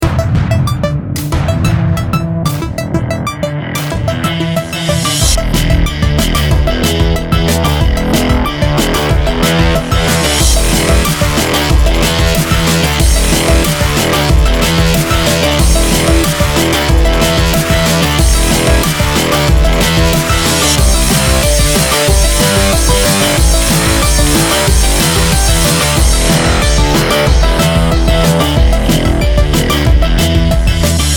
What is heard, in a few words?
minigame; mad bullets